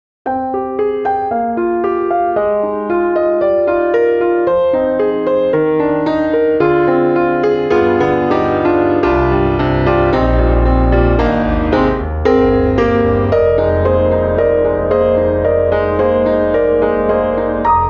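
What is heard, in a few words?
Vintage Upright, piano; Native Instruments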